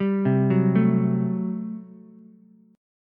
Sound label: G Major 7th Suspended 2nd Suspended 4th G down Ukulele; GM7sus2sus4 arpeggiated